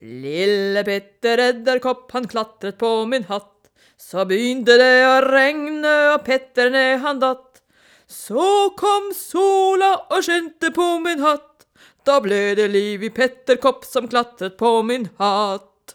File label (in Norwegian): lille peter edderkop norsk; SangeOverSundet SOS